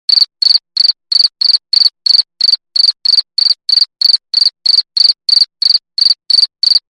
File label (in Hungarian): tücsök